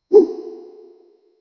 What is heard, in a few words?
cartoon style whoosh